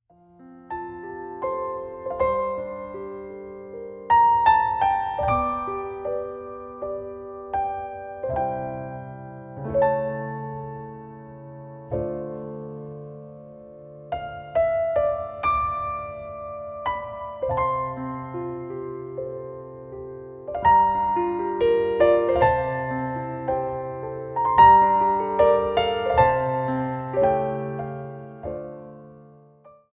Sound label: Naked Piano; Rusticana